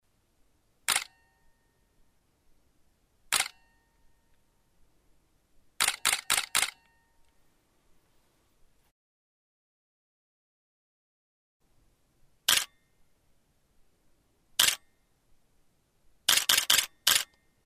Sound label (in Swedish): D800 vs D700 Slutarljud